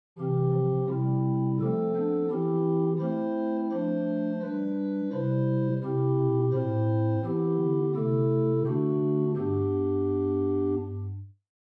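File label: Great Bourdon 8; First Baptist Riverside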